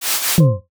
split screen snap; video editing

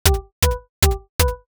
clock tick pair; video editing

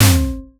impact hit

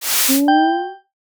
chapter card open

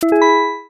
stream superchat shine

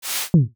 slider notch land; buttons ui

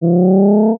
bee pass soft

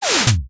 starter camera transition

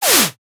whoosh fast swipe

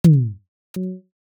awkward room tap

